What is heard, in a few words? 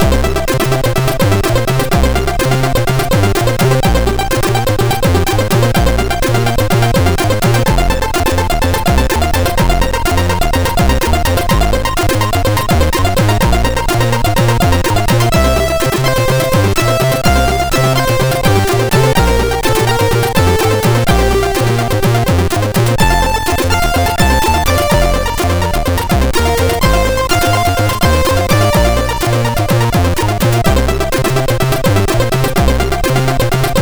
Introtune, sid MOS6581R2